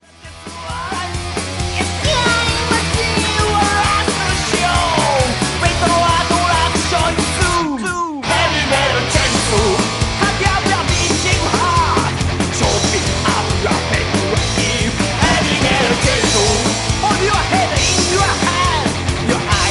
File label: Heavy Metal Chainsaw